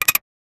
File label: short satisfying, wooden creak